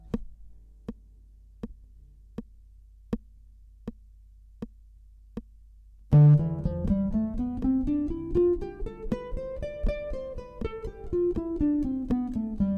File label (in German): Tonleiter Triolen dorisch, gitarre